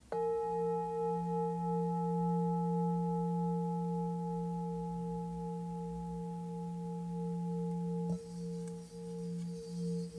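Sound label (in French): Bol ancien fa, bols chantants tibétains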